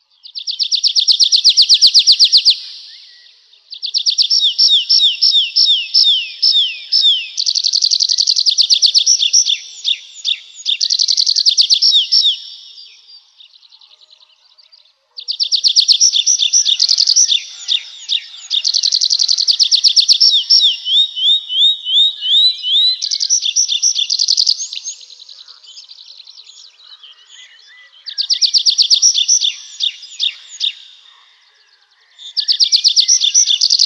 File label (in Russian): конек лесной, birds